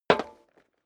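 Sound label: step dress boots metal dry single left, footsteps; schritte; menschen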